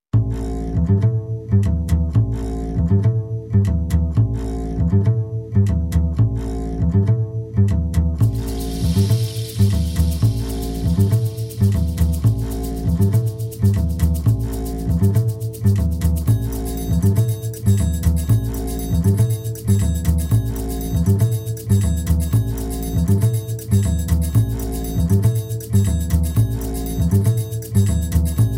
soundtrack; snatch

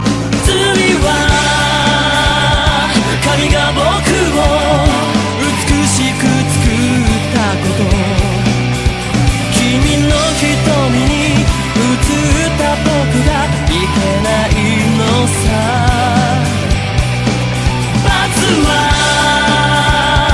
Club

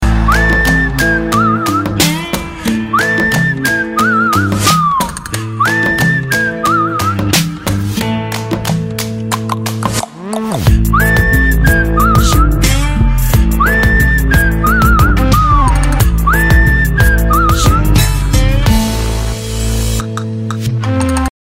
whistle

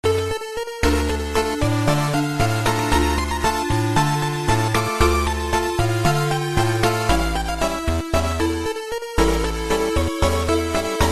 El Choclo 8 bit

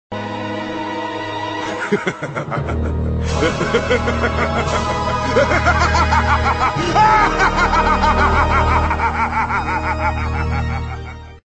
evil mad man luaghing

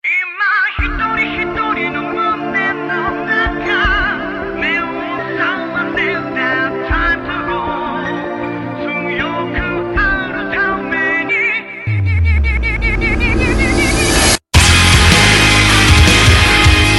guitar

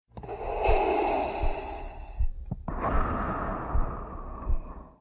darth vader breath

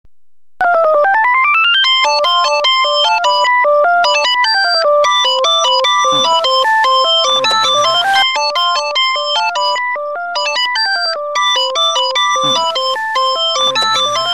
ring; Yamazakis; NHK

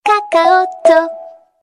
Ring; Kakaotalk

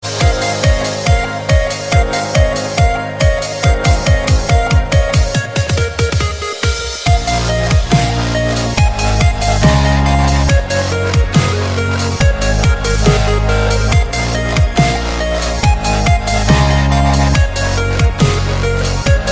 perfect dubstep tone; ringtones

